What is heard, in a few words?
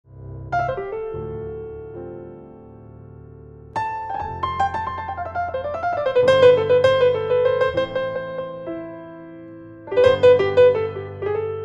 spanish house